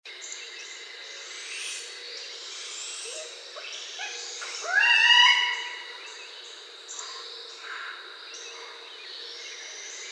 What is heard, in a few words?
Barred Owl Juvenile